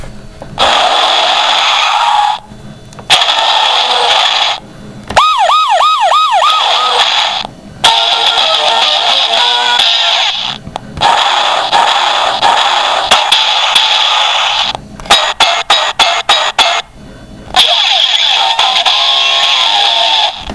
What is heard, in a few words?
Storybook Sounds; Bumblebee Rumble